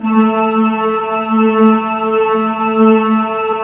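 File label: synth Morpheus; Sound & Vision - Gigapack II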